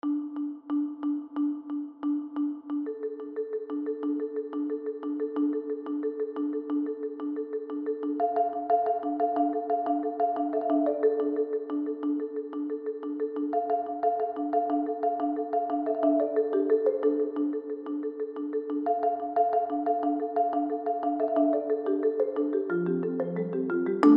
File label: Marimba